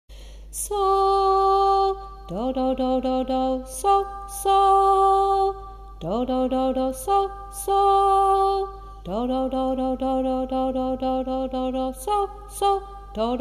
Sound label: song